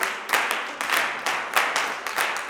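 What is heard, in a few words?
CLAPS 96, Gospel; Blues & Soul; G.CLAPS 96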